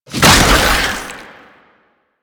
Proto Infested Babau Underground Attack Tentacle Emerge, Enemies